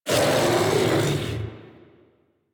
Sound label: Kubrow Armored Vocal Attack Medium